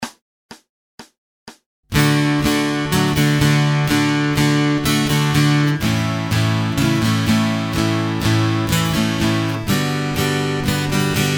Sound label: Acoustic Accompaniment, acoustic guitar; Grade 1